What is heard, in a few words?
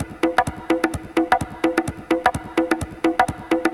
House Loop, 128 BPM; Vengeance Samples